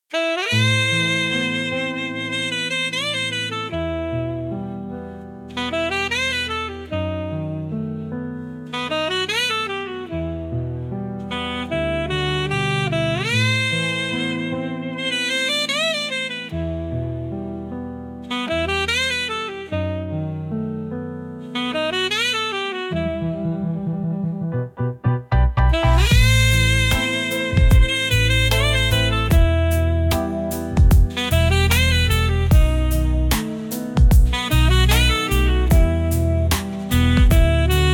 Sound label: saxophone dreams